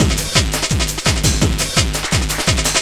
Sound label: LOOP, Eurotech; Sounds Good